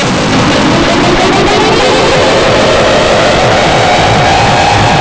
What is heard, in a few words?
portal